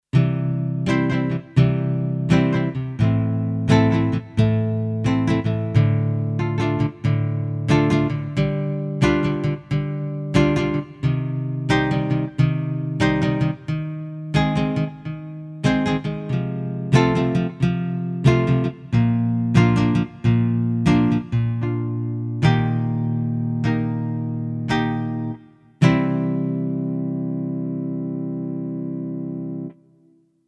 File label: Charlie Lab Digitar